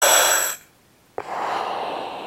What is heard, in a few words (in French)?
Respiration artificielle